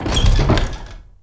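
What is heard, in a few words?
door heavy open; The Elder Scrolls III - Morrowind; Morrowind GOTY GOG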